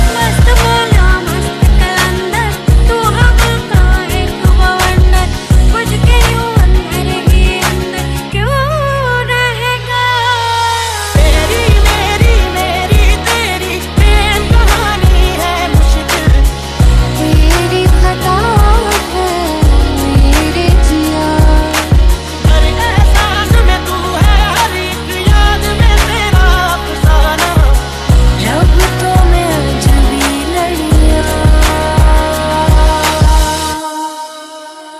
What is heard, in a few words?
Remix Hindi Songs Ringtone